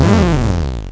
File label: die